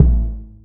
rich lows steel kick drum sound a key, kick sample tuned to the A note